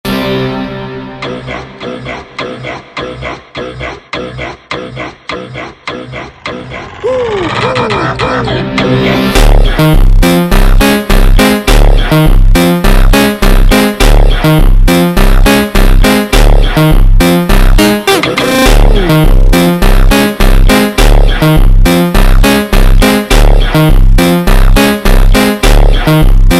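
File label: ultra Slowed Reverb, phonk music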